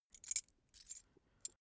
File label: key ring small put down